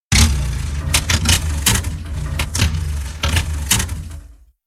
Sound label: engine sputtering out